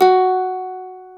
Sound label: mandolin, Stereo Mandolin